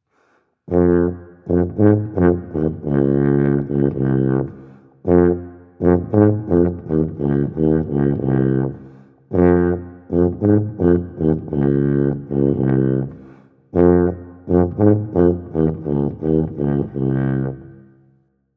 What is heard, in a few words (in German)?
Bass